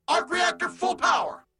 arc reactor full power

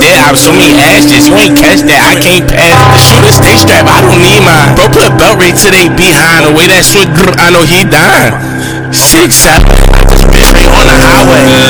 original loud

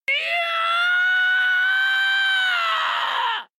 screams

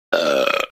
burp; simpsons

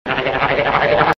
the original trombone

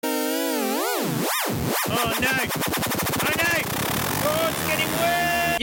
yamaha cs

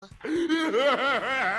hehahaha

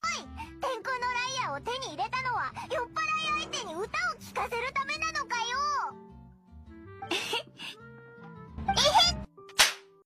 anime meme ehee

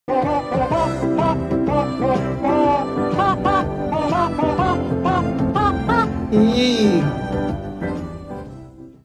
yeeeeeeeeeeee

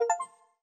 steam toast notification

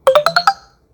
join hangout; discord

